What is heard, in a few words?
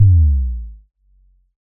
electronic kick bass drop short; SoundsCrate